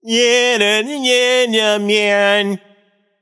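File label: tongue, non-verbal; smite; voicepack; merlin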